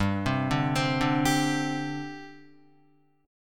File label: G Suspended 4th, Guitar, Gsus4 chord; arpeggiated; JGuitar